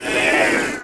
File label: scrm; The Elder Scrolls III - Morrowind